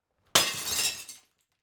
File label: Breaking Glass Mirror; Oktava Mk 012